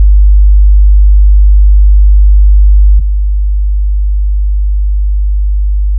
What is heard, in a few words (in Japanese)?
50Hzの音データ/基準音と低減音 [自動車の低音マフラーなど]; ペアガラス対応